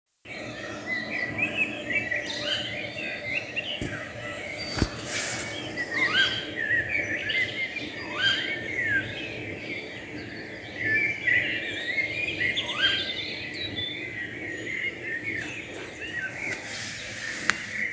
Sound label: Tawny female